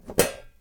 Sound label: iron box open